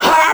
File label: squish; Crypt of the NecroDancer